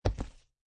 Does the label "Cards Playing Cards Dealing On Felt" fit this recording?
Yes